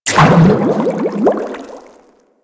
Frogman Burst, Vehicles; Grineer

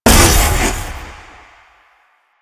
Jump Pad Launch; Warframe; Jade Shadows; Ascension

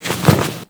Misc Foley; Warframe; Tenno

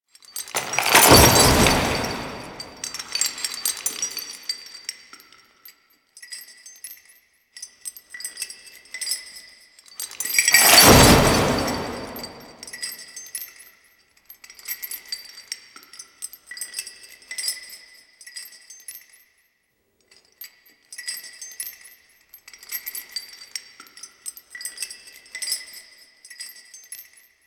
Sentient Throne Room Chain; The New War; Ballas

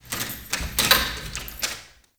Witch Station Workshop Rattle; Ambience; Soul Plane

